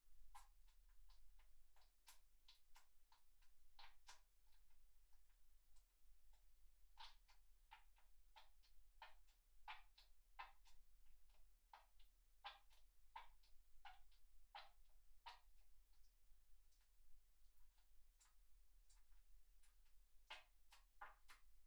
クチュ音 穴 泡立つ; KU100; アダルトな音

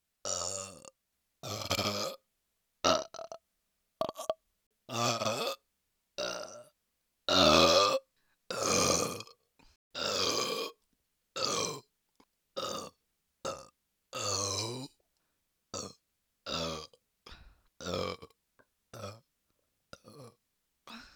ゲップのみ音声

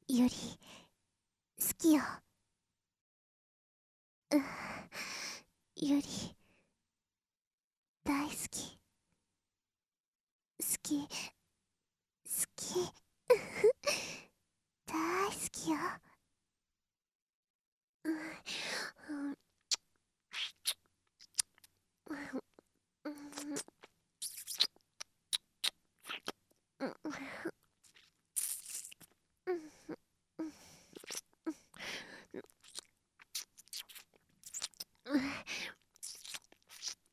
キスと耳舐め, ASMR

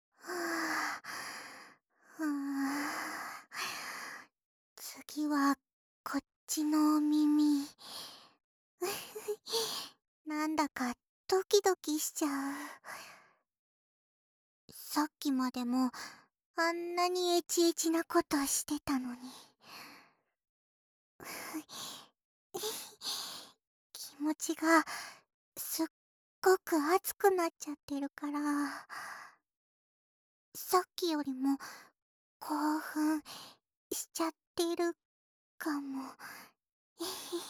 ぺろぺろ; ぺろぺろ、止まらない

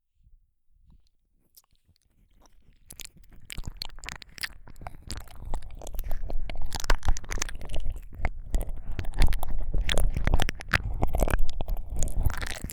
両耳舐め, ASMR

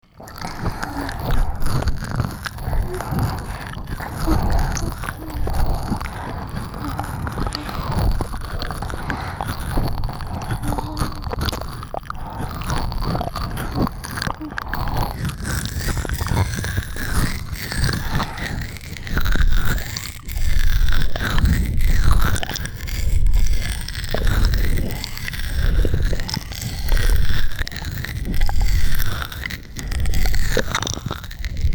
両耳舐めまったり MIX; 反転

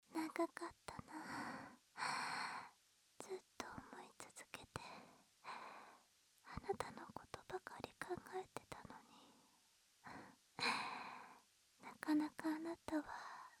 添い寝; 安眠

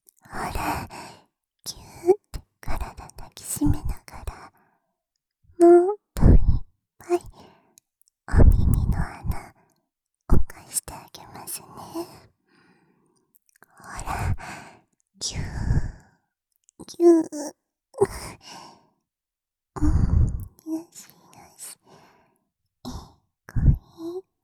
耳穴ぐぼぐぼ囁き耳舐め; 優しい年下JKに甘えた～い！

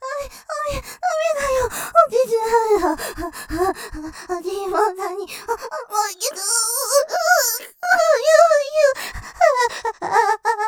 絶頂寸前; さらにスパート・ふやふや絶頂前; ループで楽しむ！シェフの気まぐれ抜き抜きコース

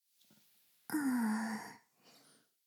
フェラ音声; 古き城のドラゴニート2

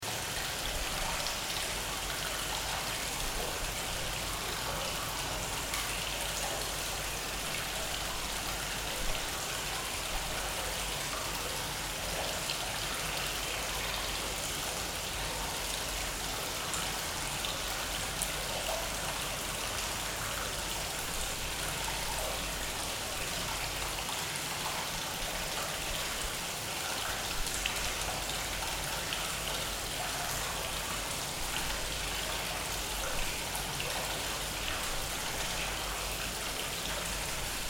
温泉ループ環境音, ASMR

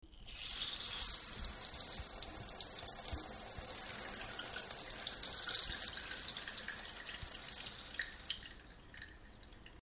しょうおおーっおしっこ (溜まって少し流れていく)

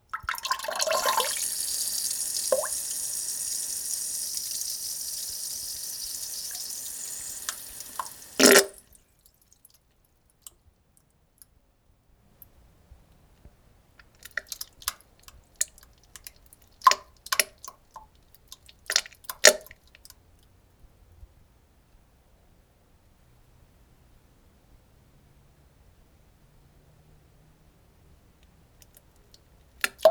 もう少しなんべんになってきた おしっこ途中と後になんべん：ちちちち じょじょじょじょー（ぴっちょん）

